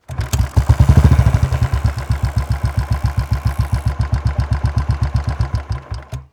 Royal Enfield, Bullet, Kick, Start, Rev, Idle, Stop; Royal Enfield Classic 350; Bombay Sonic